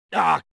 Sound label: hugs tap